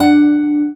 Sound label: Classic Guitar, notifications